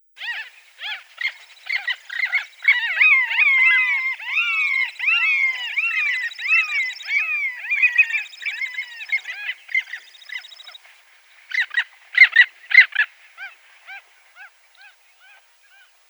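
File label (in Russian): Pomornik dlinnohvostyj, long-tailed skua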